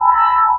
med tone; Dystopia; implants

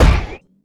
heavy metal, footsteps; player; heavyfeet; Dystopia

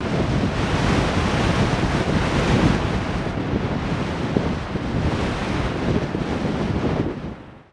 wind; dystopia; vaccine

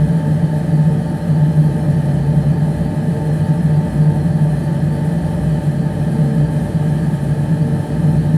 air vent; Dystopia; injection

